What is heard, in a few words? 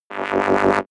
sci-fi scanner wobble low